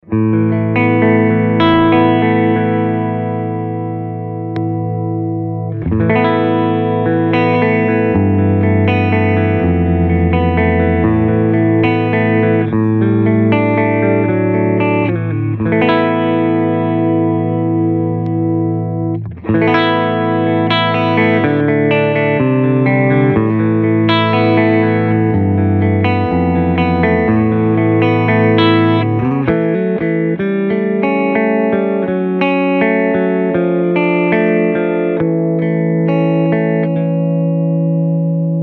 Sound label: Compressor